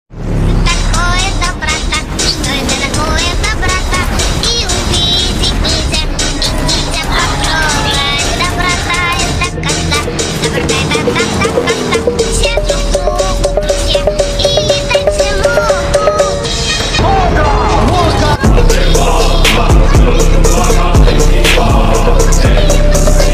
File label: phonk remix